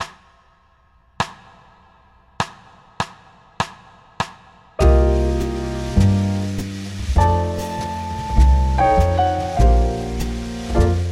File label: Jam Tracks Jazz Solo Section Bb 100 bpm